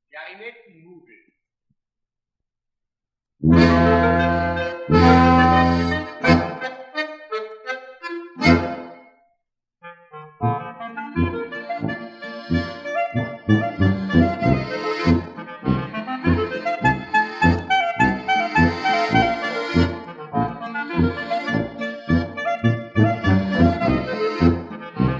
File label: Austrian Folk Music - The Clarinet